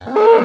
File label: cow hurt; mob; minecraft pe 0.6.1